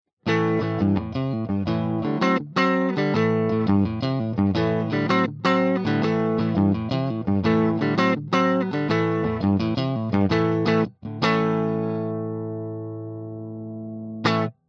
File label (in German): di fender 59er strat; Line 6 Variax JTV-69